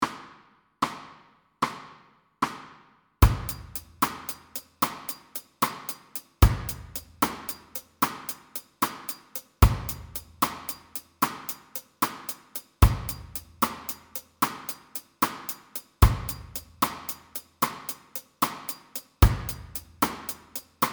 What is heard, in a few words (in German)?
Triolen Achtel